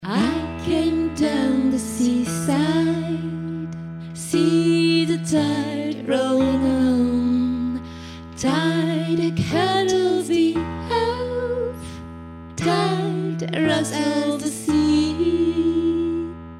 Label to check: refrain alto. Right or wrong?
Right